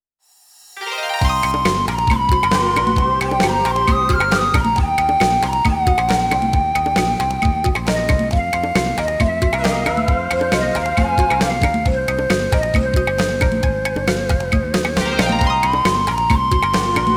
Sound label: SC88Pro